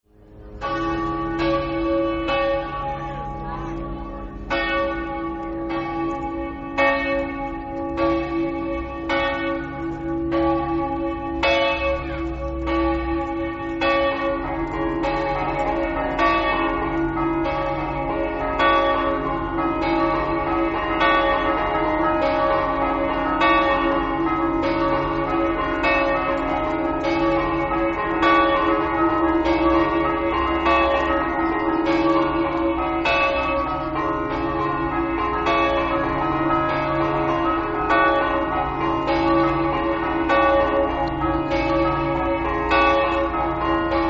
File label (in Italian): rizzolo, campane